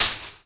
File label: barb hit, Impact